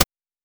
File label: snare